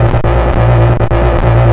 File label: bass 138 A#, bass-licks, Techno; instrument-loops